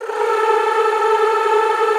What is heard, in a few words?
A3, Classical Choir; Best Service; Extended Classical Choir